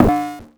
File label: Cwejman S1 Tom Bell